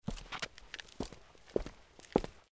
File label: Dried Steps